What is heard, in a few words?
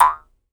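Jaw Harps, Pitched Percussion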